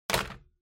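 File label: Ceiling Light (remote control Put)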